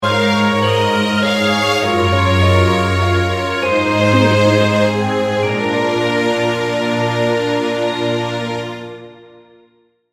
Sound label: cinematic romance sting bumper